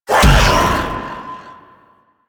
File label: Whirlwind Dash Impact; Warframe; Caliban